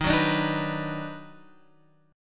D sharp Minor Major 7th Sharp 5th, Guitar Half Step Down